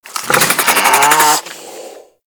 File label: Infested Charger Idle, Enemies; Warframe